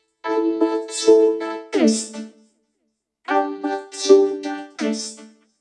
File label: Vocoder 5th Stack; AX Edge